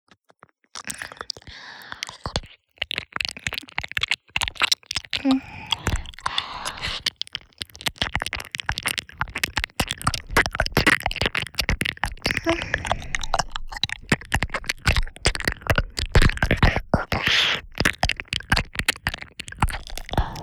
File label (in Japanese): 耳舐め 左, ループ音声; ココロノオト製品版データ; ひまわり耳舐めB